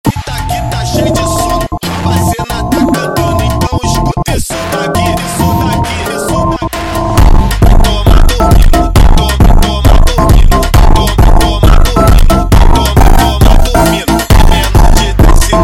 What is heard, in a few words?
Funk sped Up